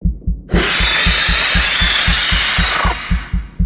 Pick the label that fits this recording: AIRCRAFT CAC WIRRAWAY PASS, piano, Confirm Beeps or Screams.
Screams